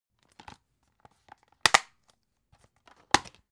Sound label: dvd case handle open close